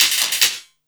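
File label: percussion, 150 BPM, drum & bass; Ueberschall; Drum & Bass Resonance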